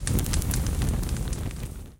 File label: Prop Flames; BTD6